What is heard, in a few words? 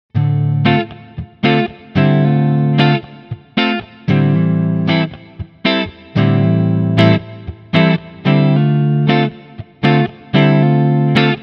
Epiphone Les Paul 50s Neck Clean